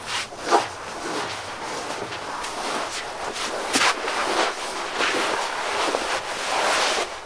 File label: Man Crawling On Carpet Looping